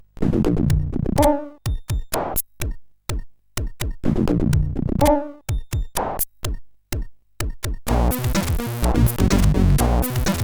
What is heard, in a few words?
Strange Beat, sid CSG8580